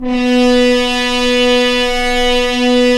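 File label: french horns, swells; Roland LCDP06 Brass Sections; F.Horns FX+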